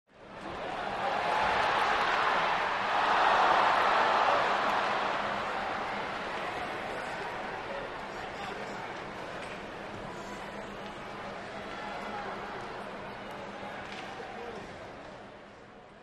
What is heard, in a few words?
Large Sports Crowd, reactions